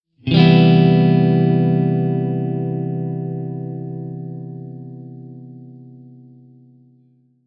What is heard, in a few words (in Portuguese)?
Acorde de Tetrade de C7M